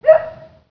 dog

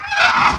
hurt

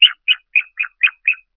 bird

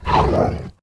attack; mahlendur

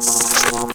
attack

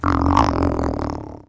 happy

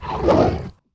attack

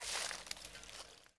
RUSTLING

